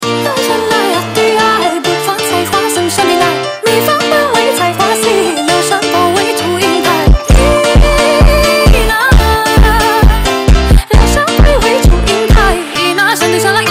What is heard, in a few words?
Phonk